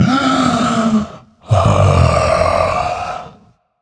nv breath, vox; smite; voicepack; vulcan

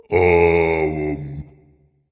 seriously dragon; fafnir

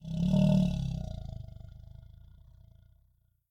grunt idle; fenrir

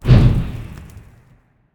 swing; smite; guanyu

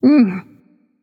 clear throat light; smite; voicepack; hel

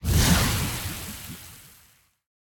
rocket tail; smite; vulcan

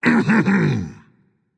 clear throat; smite

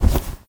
movement armor; sobek; smite

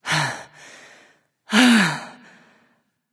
breath; smite; voicepack; hera

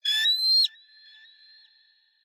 ambience good bird; smite; conquest

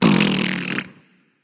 tongue, voice; Smite; ymir skin14

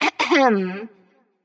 clear throat light; smite; voicepack; hel

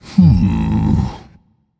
thinking; smite; hunbatz

